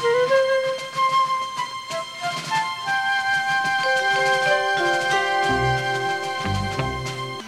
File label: theme ringtone; higgins